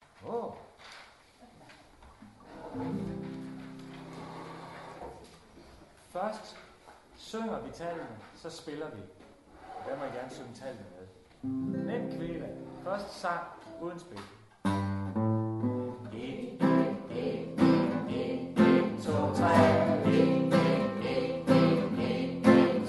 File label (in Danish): Sang